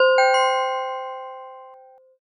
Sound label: gamelan